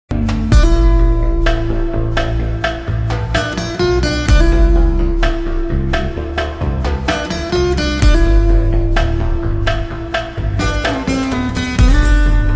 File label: Bgm Ringtone Slowed Reverb